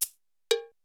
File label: Clickk; Pro Tools